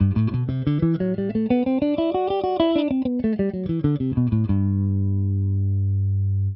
Sol Ionien, Guitare

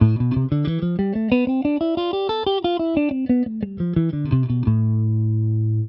La Aeolien, Guitare; Mode Aeolien à partir de La : Tonique à la 5è/17è case; La Si Do Ré Mi Fa Sol

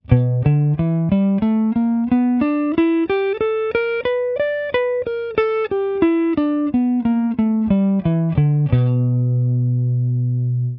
Penta b5 4e position, Penta Bluesy, Guitare; 4ème position Pentatonique No 4 en mineur + b5; La pentatonique bluesy; T b3 P4 b5 P5 b7

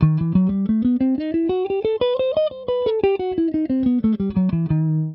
Re diese Altere becarre 6, Mineur harmonique, Guitare; exemple audio "ré # altéré♮6"

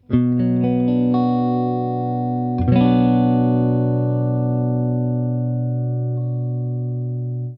Si mineur, Guitare Accords ouverts

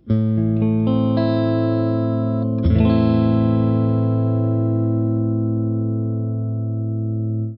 La mineur, Accords ouverts, Guitare

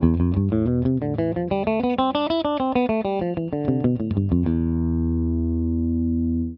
Mi Phrygien, Guitare; T b2 b3 P4 P5 b6 b7 ( Penta min + b2 & b6 ); Accords compatibles : Mineur ou Mineur 7

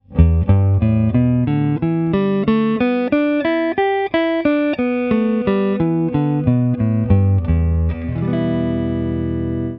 Pentatonique mineure de mi, Guitare; 1ère position

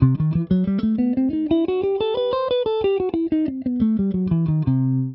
Do Lydien diese 9, Guitare; exemple audio "do lydien #9"; Mineur harmonique

